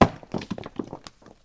Stone Collision; fowl

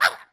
Bird Green Damage; fowl

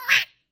Bird Red Collision; fowl

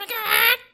Bird White Talk; fowl

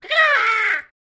Bird Red Special; fowl

